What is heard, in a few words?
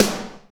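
snare, Techno Rock; Northstar; Drumscapes Roland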